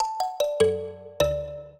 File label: minuet, mbira; Mozart